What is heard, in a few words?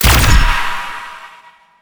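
military crossbow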